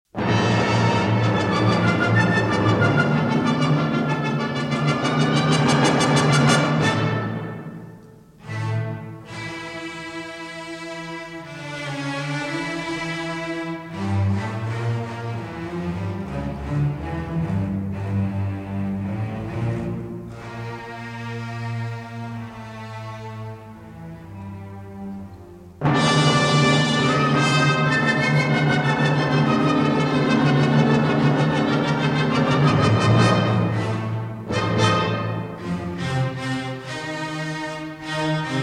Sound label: Symphony in D Minor, Choral, Presto